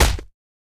fire impact; smite; poseidon

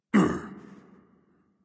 lobby victory; smite; chaac

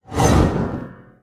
whirlpool trident swing; smite; poseidon

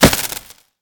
Hit; smite; raijin

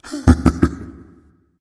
hehheh; smite; voicepack; thanatos

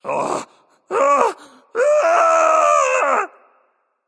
fear, voicepack; smite; jorm

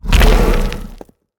shield end; smite; god geb skin7

